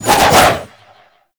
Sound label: Arch Wing Sword Spin Whoosh; Warframe